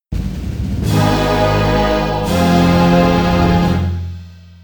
Fight Over; Freezing Fortress

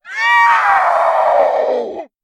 knockout; devour

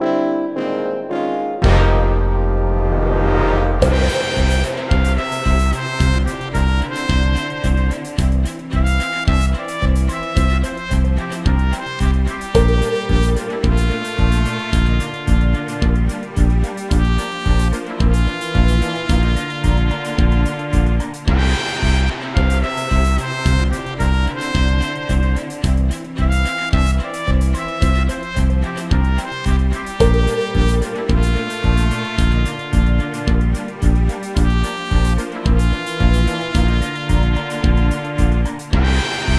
Jingle Result; Dragon Ball Z: Kakarot

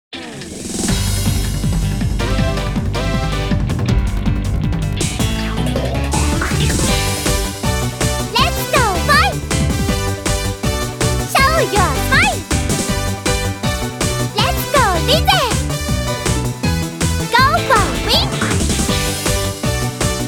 Cheerleading Song; reco love original soundtrack 2017

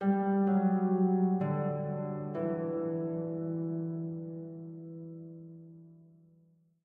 Harp Low; gamerip; that dragon cancer

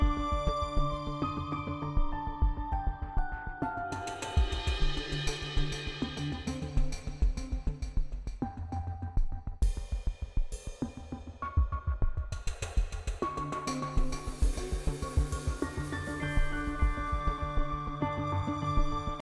Melody Loop; bakugan champions of vestroia 2020; switch gamerip

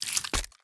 Egg Drop; The Inn

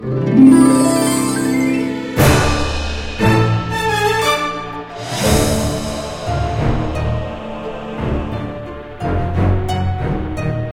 Quest Ending Intro; club penguin island; android

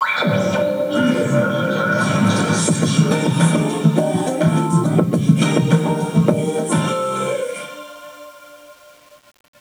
bgm karaoke; yakuza ryu ga gotoku kiwami 2 pc gamerip

